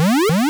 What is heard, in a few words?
grow; scrapeboard